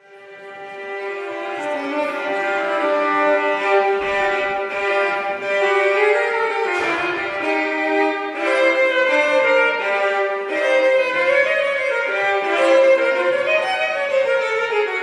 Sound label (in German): Vorspiel